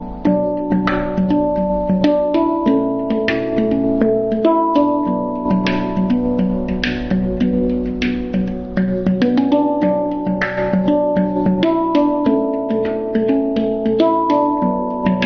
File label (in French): handpan doré 9 notes